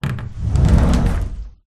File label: household cupboard door wooden sliding